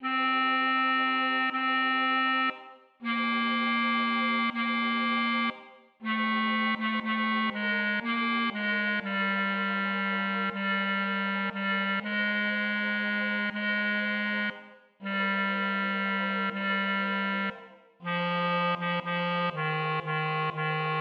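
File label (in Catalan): Baixos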